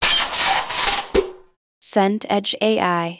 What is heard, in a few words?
bed springs shift